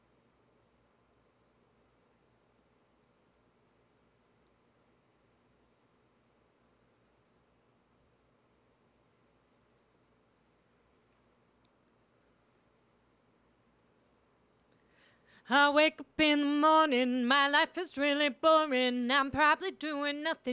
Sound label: taylor 635a test